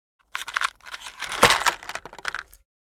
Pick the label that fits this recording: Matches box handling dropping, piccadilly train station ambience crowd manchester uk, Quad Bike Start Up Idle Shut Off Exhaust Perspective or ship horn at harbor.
Matches box handling dropping